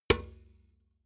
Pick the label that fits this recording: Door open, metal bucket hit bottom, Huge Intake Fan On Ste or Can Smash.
metal bucket hit bottom